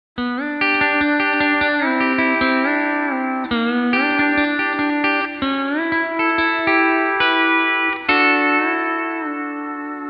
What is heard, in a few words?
F3 to A, Copedant; D13; RKV